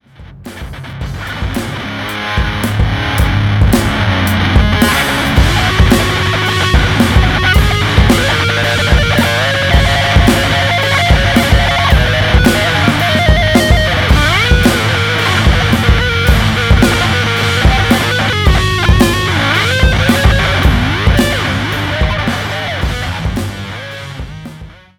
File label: beginners guide to grunge, drums; Stadium rock drums showing off the sound.; kingstondrums; easyrider